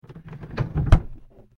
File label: drawer close